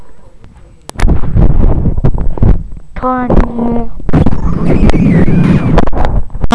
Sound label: toy